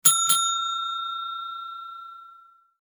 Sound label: hotel desk bell two rings